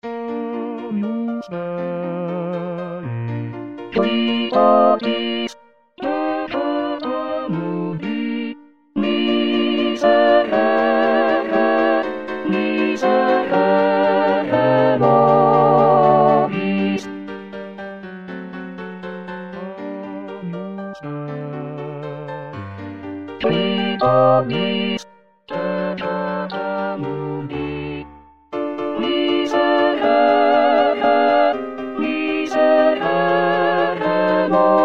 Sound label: Orgel Messe Agnus Tutti; Haydn Kleine Orgelmesse